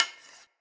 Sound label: Hunter Bow Reload Arrow Knock; Weapons; Enemy; Bows